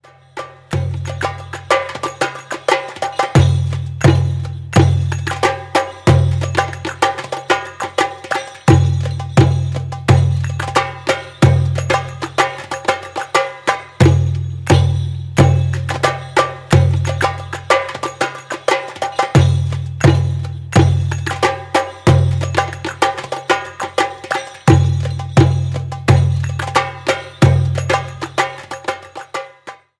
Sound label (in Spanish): MASMUDI KABIR x 3 lento